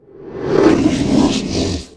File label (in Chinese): 攻击; Enemy; 鬼,幽灵